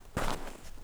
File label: FOOTSTEP Trainers Snow Compact Walk Slow